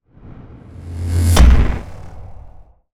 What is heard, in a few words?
whoosh impact; Bluezone Corporation; Cinematic Trailer Sound Effects